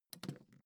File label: suitcase lift up hard